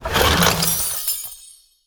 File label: Arena Treasure Chest Attack